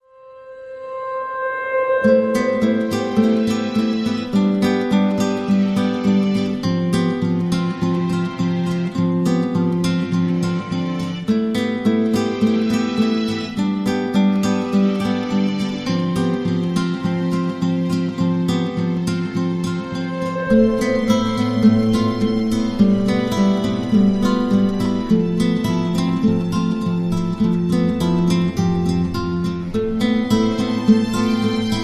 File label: Guitar and Strings; 60sec